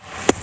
sand; Computer Buyer 1997 August; Jack

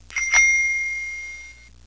DOORBELL; Sound Library 1

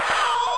Auu; Wormland

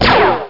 bounce; Crossfire Demo

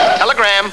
TELEGRAM

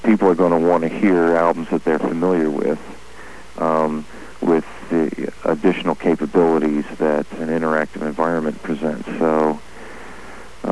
audience

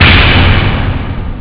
boom, drums snare; OctaMED SoundStudio V1

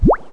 bug; Flying PopCorn 2001

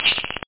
shaker; da capo vol1; drums

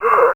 Noise; Revista CD Expert 50

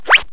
STREAK; Leonardo The Inventor; Broderbund; Riverdeep

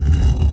stone; allods2d

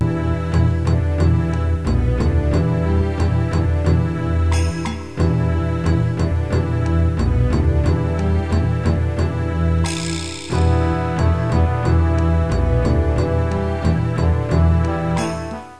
music

